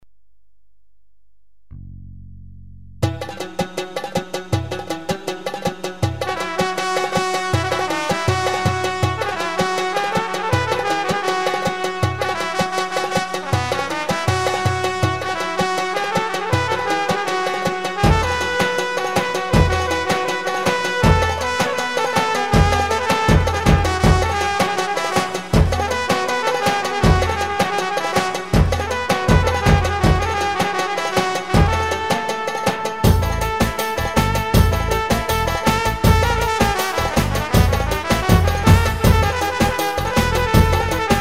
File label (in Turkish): sx tekten, sx3000 ritim; yeni ritim demo